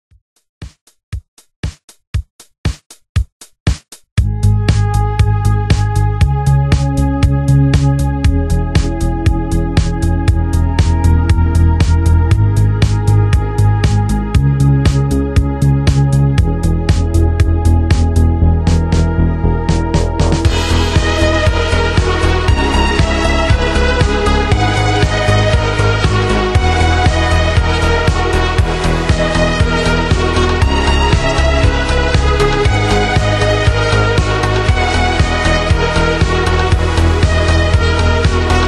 slowed reverb

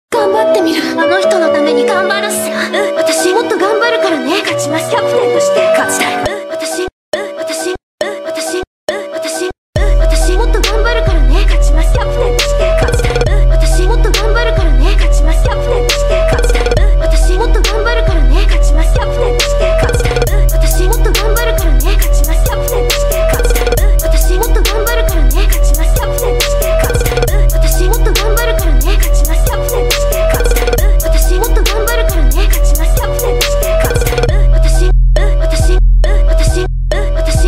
bored beat

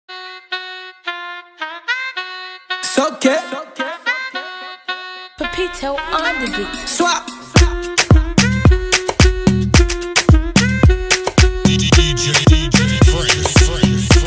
dance class; shakushaku

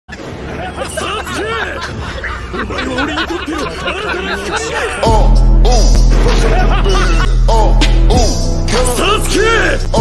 psycho laugh; Uchiha; Zangetsu Akuma; Naruto Shipudent

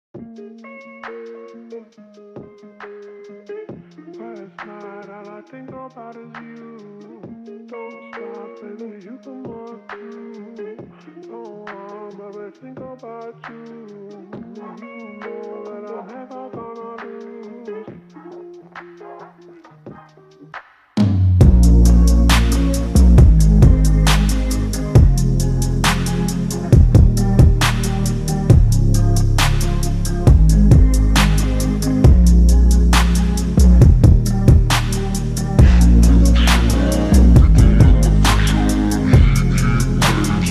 slowed reverb

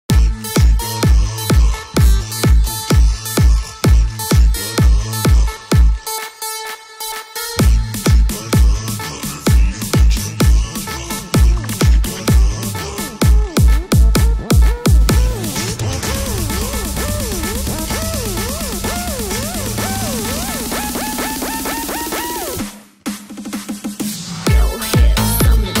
Fast Party Club Mix

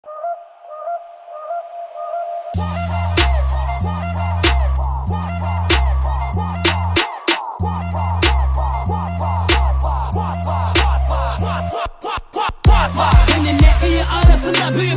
fulute remix

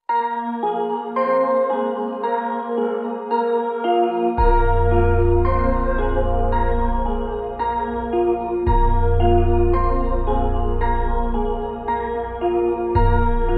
instrumental slowed

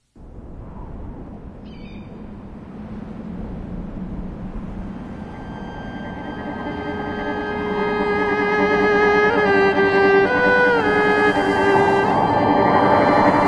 electric trio

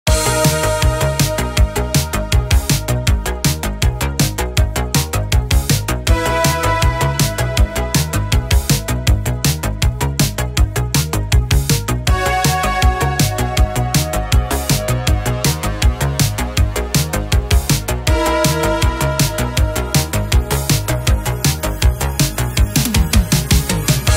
soundtrack chase music; Ice Scream 4 Official Soundtrack Enhanced Chase Music; Keplerians

